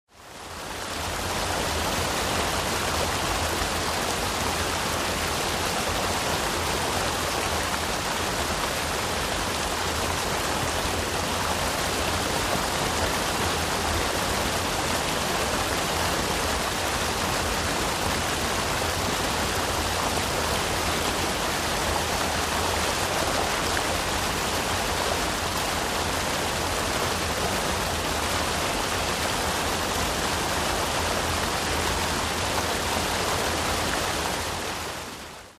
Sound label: Water Rapid River Strea